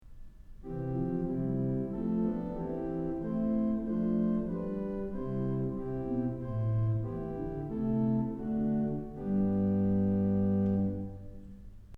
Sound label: Diapason; Kellogg Auditorium